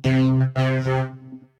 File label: game over; Funky Physics; Wii U